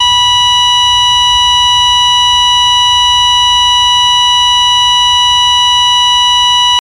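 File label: Heart Monitor Flatline, heartbeats & ekg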